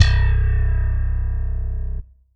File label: Roland GR 1 Slap Bass C3